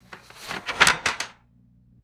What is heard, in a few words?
sliding and removing a ream of paper